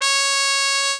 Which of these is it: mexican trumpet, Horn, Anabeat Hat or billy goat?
mexican trumpet